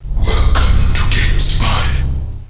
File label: app startup